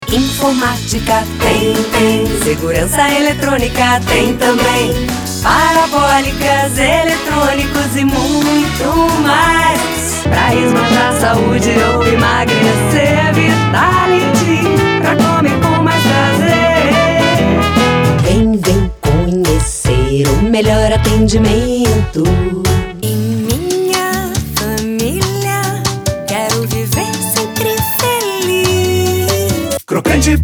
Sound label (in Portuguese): Português - Brasileiro, Canto (voz principal e coros)